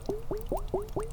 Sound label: looped burst